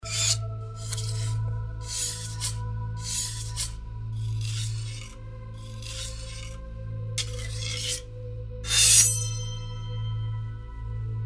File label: Swords; horror; ultimate horror package